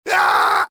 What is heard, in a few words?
pain, male; Soldier Of Fortune II Double Helix; XBOX